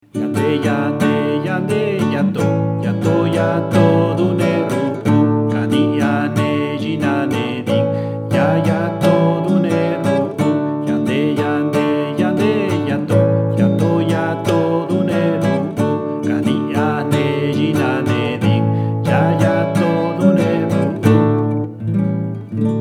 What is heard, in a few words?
song sung with guitar